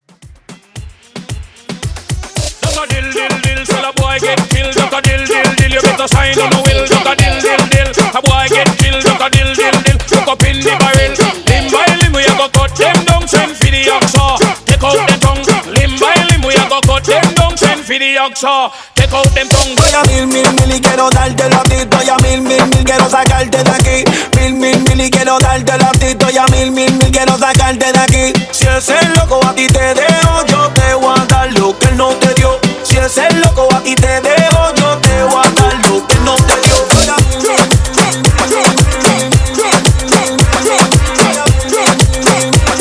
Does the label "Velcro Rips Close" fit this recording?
No